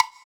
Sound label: Wood, Block Stick; Equipped Music; Loungin House